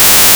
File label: multitone white